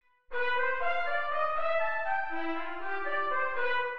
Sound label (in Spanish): Maj7 en C